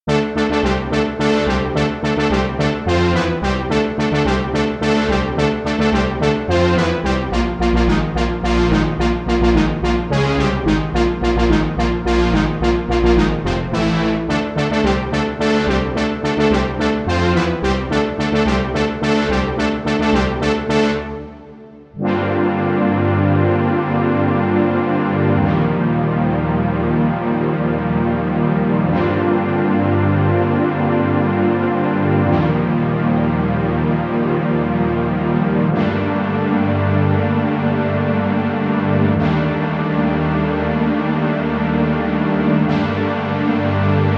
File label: supersaw, sampler